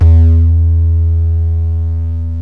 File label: db bass, yamaha rm1x synth